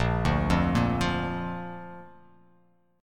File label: A sharp Major